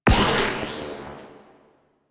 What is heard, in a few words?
Corruption Pickup Hostile Small; Warframe; Missions; Zariman